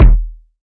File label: fat kick drum single shot d sharp key